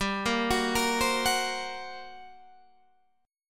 G Minor Major 7th Double Flat 5th, G, Guitar, Standard; arpeggiated; JGuitar